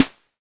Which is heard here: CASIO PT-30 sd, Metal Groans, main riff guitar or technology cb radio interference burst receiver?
CASIO PT-30 sd